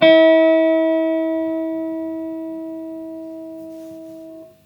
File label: full volume, guitar